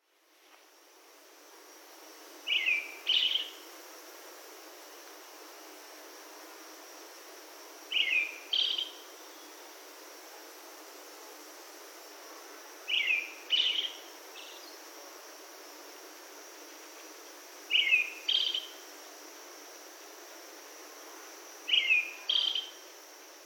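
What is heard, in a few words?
Brown-headed Thrush; akahara; yaita; koe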